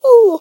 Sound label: whimper; Scrat